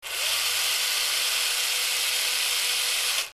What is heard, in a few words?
drill